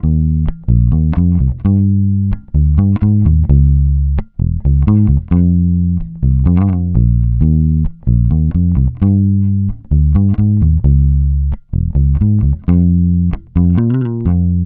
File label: Bass loop 131 Eb; Electric Bass Loops; Slate Digital BASSHOLE Sample Pack